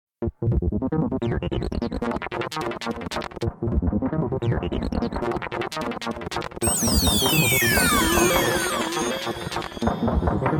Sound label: saw deep trance